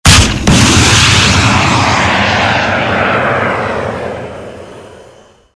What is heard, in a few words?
tow launch